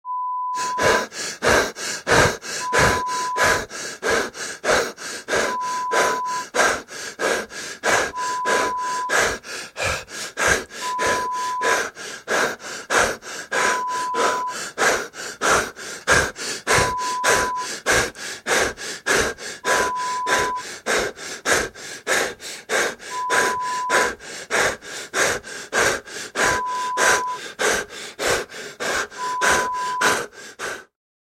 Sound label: fast breathing sounds